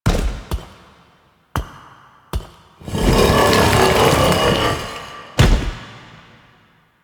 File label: Qorvex Idle Agile Throwing; Warframe; Warframes; Idles